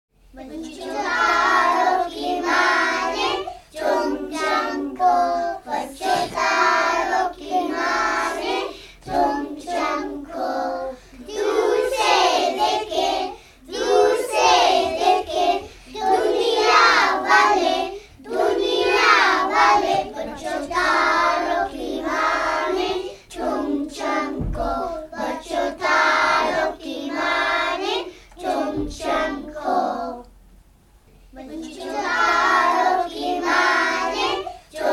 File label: shine like a star song